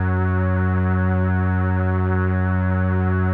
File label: 505, String Machines